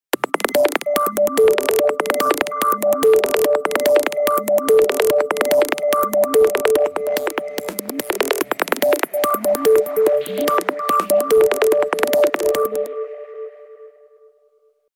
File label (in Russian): standartnyy zvonok na sony t3; melodiya